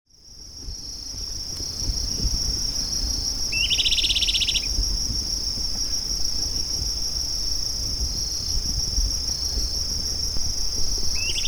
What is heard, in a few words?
Myiophobus fasciatus Filipe